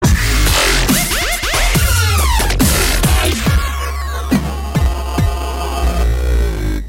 Rain Is Gone Dubstep

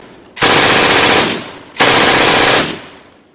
Mg42

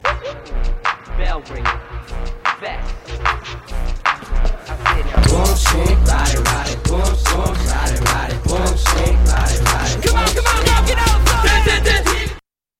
Body Dance

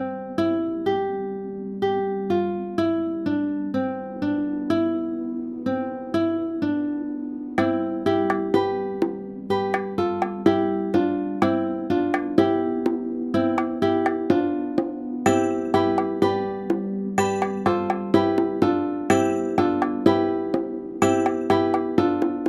relaxing alarm

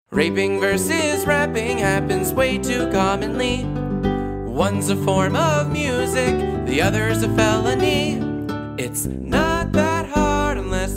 Rapping Song

